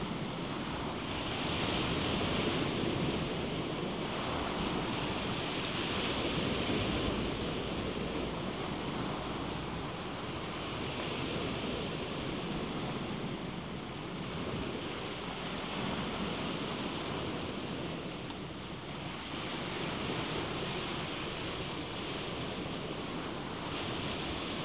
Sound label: Waves Cicada; capo mele